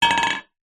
household cup metal camping knock over wood counter; zapsplat